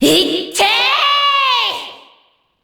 super happy; makoto

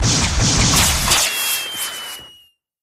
missile; sarge

